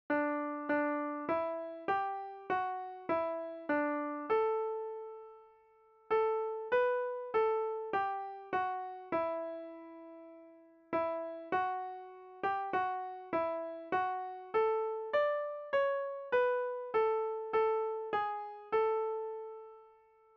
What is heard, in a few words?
hymn melody